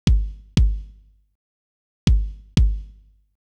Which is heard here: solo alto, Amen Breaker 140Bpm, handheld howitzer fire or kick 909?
kick 909